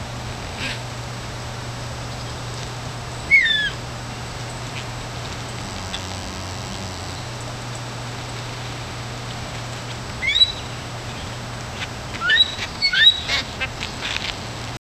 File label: a fluffy white kitten, park